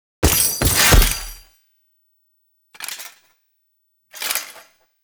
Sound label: first death foley; Enemies; Warframe; Duviri; Dax